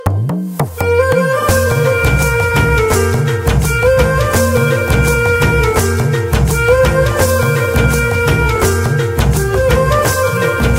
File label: Instrumental Tone